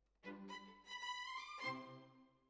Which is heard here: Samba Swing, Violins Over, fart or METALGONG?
Violins Over